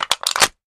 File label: Aluminum Can Crush